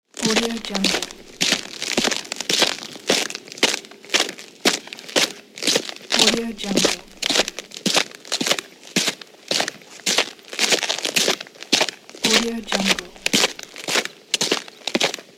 walking on stones

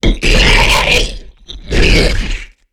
monster within bearer vocal agitated; human sounds; صدای هیولا

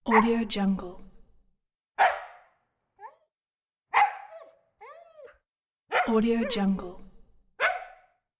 husky dog barks and whines

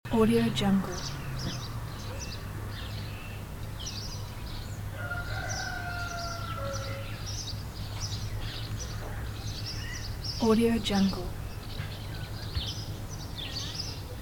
busy summer village ambience loop; urban sounds

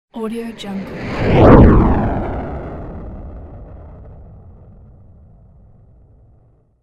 burning comet flyby; futuristic; عبور ستاره دنباله دار

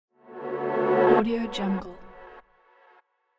transition chord downwards long delay; transitions and movement

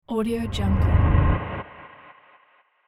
transition dark low long reverb; transitions and movement; ترنزیشن فضای ترس و خطر

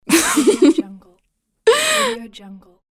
female teen short laugh; human sounds

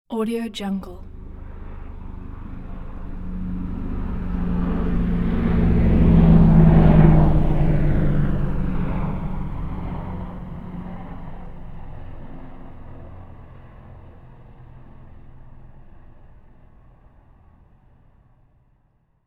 helicopter long flyover

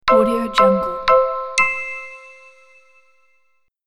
soft chime countdown

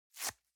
foley carton box small tear apart soft; پاره کردن کاغذ

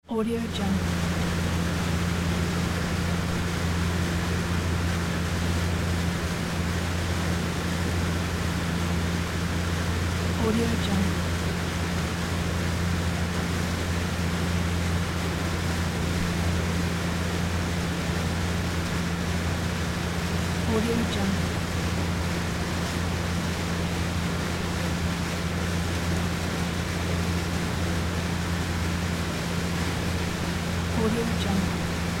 motorboat ride; urban sounds

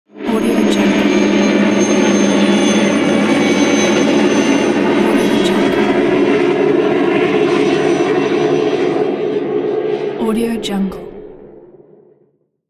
helicopter sound; دور شدن هلیکوپتر

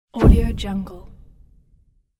kick explode impulse low; game

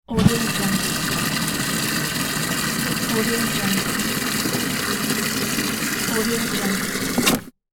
filling plastic watering can; domestic sounds

